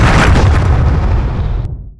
petrify; weapons; dota; earth spirit

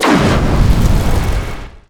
firestorm; underlord

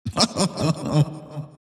crown laugh; dota; monkey king

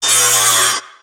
tango cut; dota; shredder

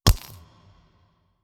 plink peg last; ui; economy

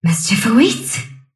sylph move pain; dota; dark willow

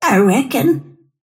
move pain; snapfire

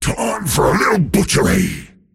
attack pain; dota; pudge

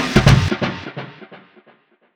frog stomp cast; weapons; dota; largo

